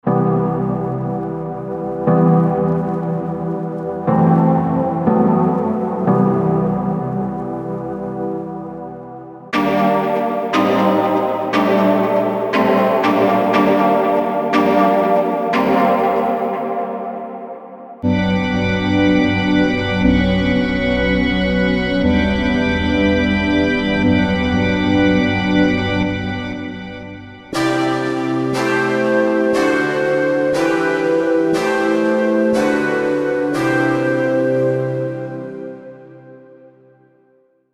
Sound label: Lydian Ethereal Chords